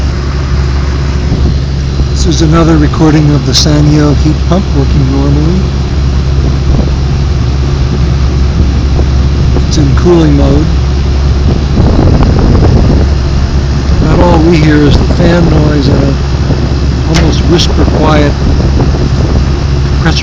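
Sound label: Sanyo AC Compressor; aircond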